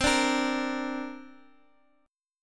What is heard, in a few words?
C 7th Suspended 2nd, Guitar, DADGAD